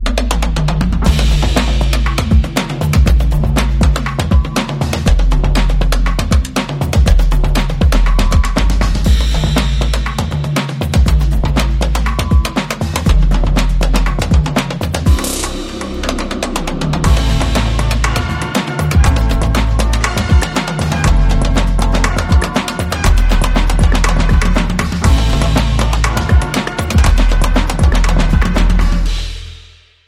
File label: Break Beat